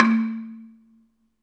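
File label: Balafon 2 A2, Asian Traditions; Soundscan vol.60; AKAI; BELAFON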